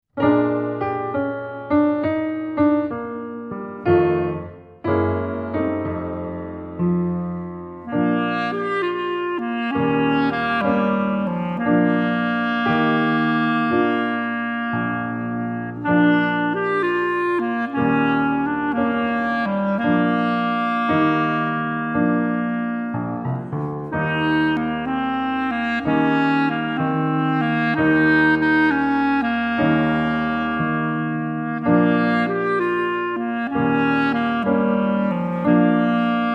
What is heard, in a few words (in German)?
blues for beginners klarinette klavier